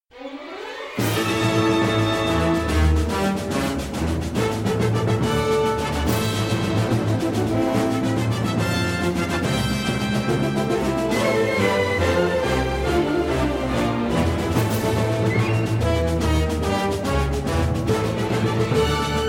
Fanfare

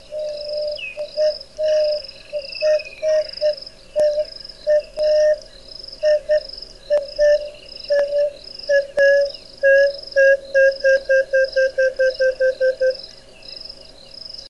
Emerald Wooddove

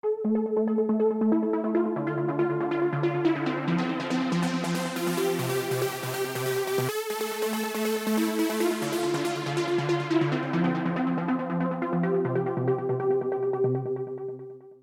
Cool Sms Techno

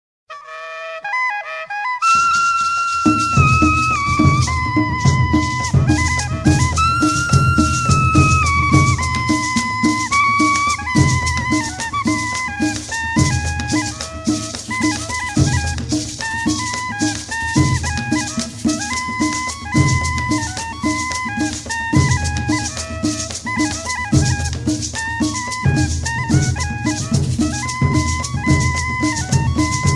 Cumbia

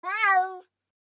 Meow

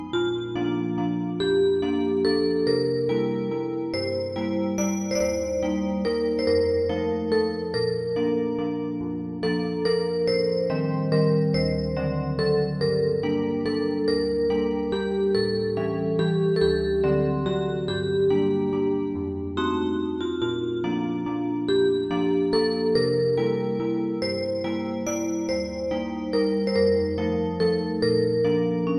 Azeri

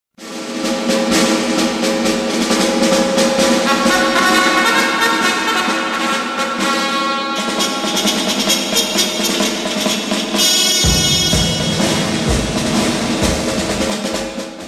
Trumped Sound

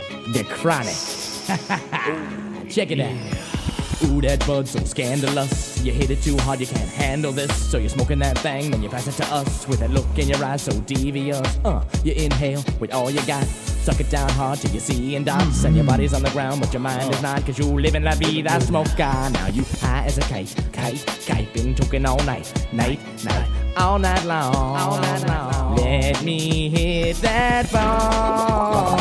Bong

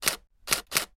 camera shutter D750

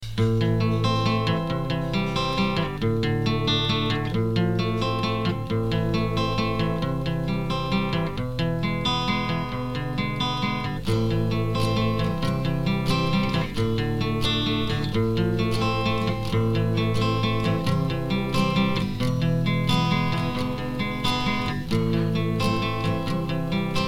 Acoustic Guitar

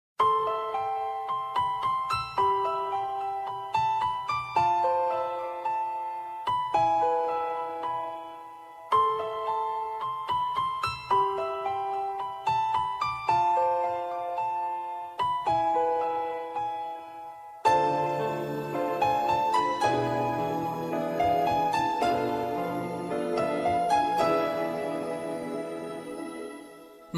piano slow

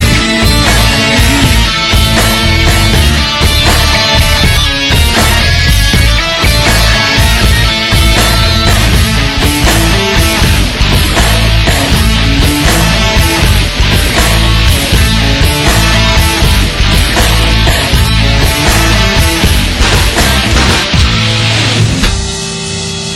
Rocking Guitar

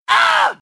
Voice female ahhuu

